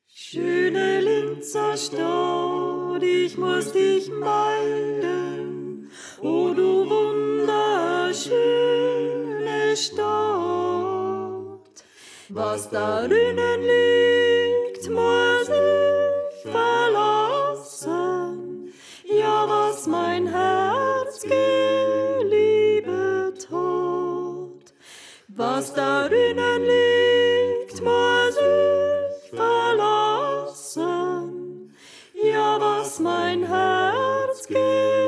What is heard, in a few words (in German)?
Sopran; Schöne Linzerstadt